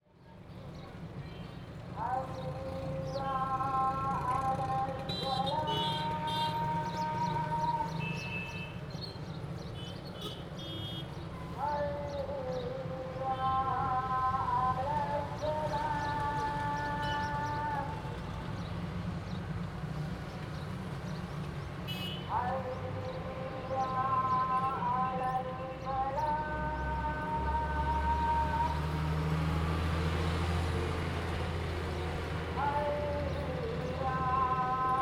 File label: DAYTIME, MUSLIM PRAYER OVER CITY, TRAFFIC, PEOPLE, HORNING, GENERAL AMBIENCE IN JODPUR; RAJASTHAN; INDIA; Creative Audio Pool; INCREDIBLE SOUNDS OF INDIA COLLECTION